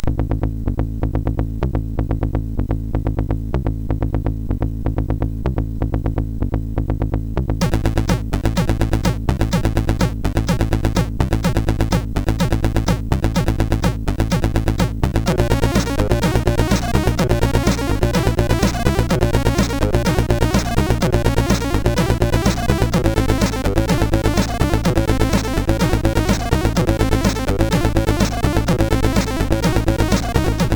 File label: Introtune sid MOS6581R4; hvsc